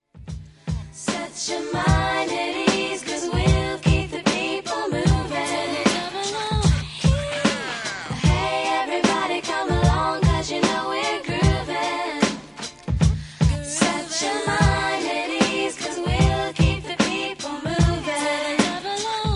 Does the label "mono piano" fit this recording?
No